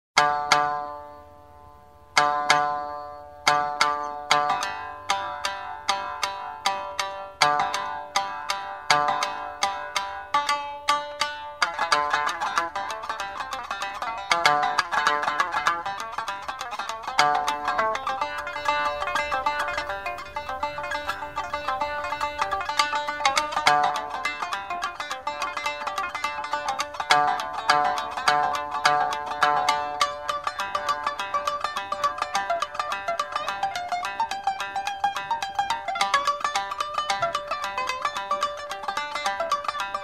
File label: kora